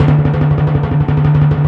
Orchestral Perc, Timp FX; Roland LCDP03; Ambo TimpRol

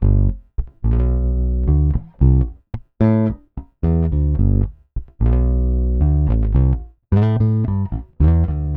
Pop, Bass

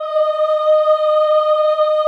CHO ES5, Ooh; Ooh + Ooh 2

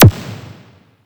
Hi Q, Yamaha PSR E305

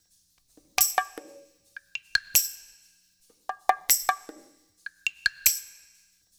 LOOP, Percussion

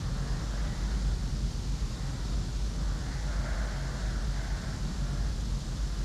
Ambience Loop, outdoor

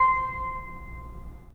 3D Pipe Mix Celeste, 3D Organs

